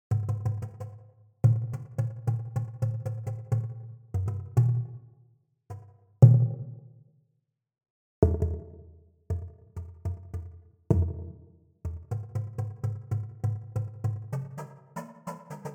Chromaphone

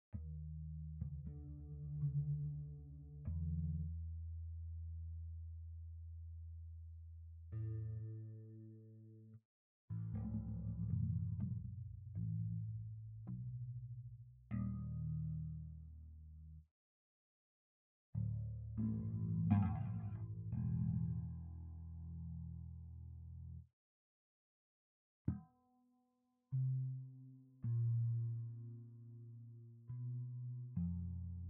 Electric Bass

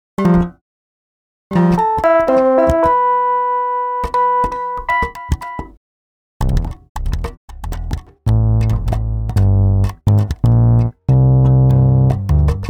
Elektrik Kalimba

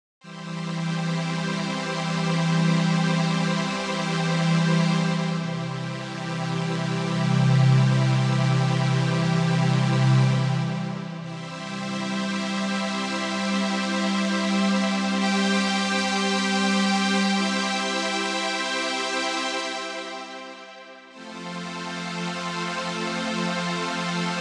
Analog Lab

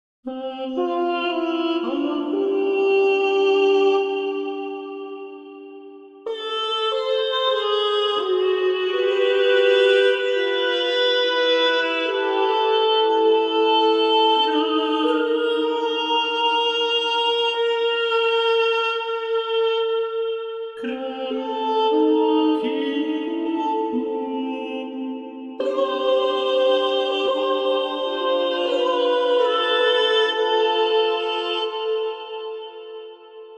Altus

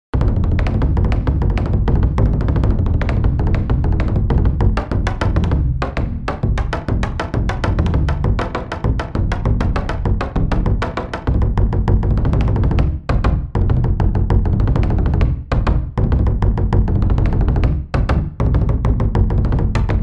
World Percussion

